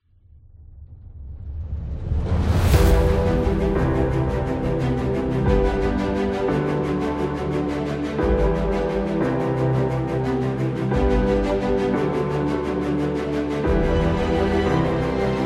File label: Epic Trailer; epic music vol 2